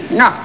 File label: "nyuk"; three stooges